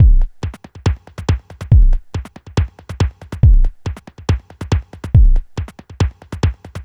Accent Groove, Drums & Percussion, Electronica; Sonic Foundry (Sony Creative Software) - Electronic Point Blank

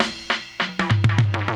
Dub Roll; Zero-G Sound Sense Grime Scene; 90 s Sample CDs

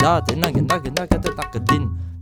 INDIA L, Heart of Asia, IND ENS; Spectrasonics - Heart of Asia CD2

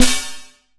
XTRA PERC

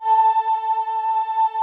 VP CHOIR F3, VOCODER; Greytsounds VOL-1

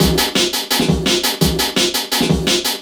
Classic Beat, Drum N Bass; Sonic Foundry (Sony Creative Software); Knutrix Drum & Bass

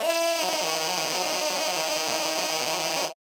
Casio, Glitched, malfunctioning synth

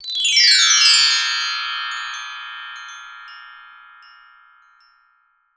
BRASS M-L, TREE BELLS; Voice Crystal - Steve Reid Definitive Percussion

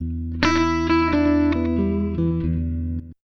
BLUES, Acoustic Guitar Loops; USB Soundscan vol.04; AKAI

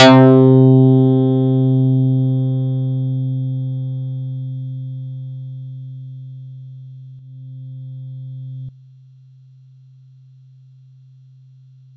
Yamaha YX81Z Elec Bass c4

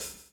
BLOW; Spectrasonics - Backbeat

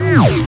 BOING; Distored Reality

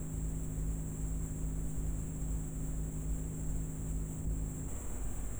Misc Noise

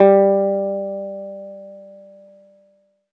ZITHER; Best Service; Alpine Volksmusik

